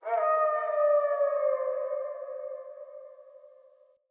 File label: Wolf Cub Landscape Howl; Vocals; Animals